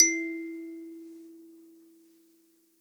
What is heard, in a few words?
Ikembe E1; Multisamples; Ableton Live 12 Lite; Core Library